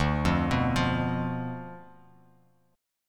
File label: D Minor 6th; Dm6 arpeggiated; JGuitar